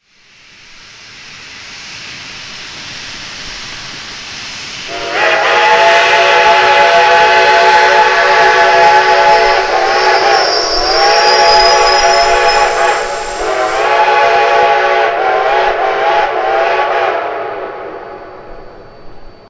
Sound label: 6, steam whistles; reading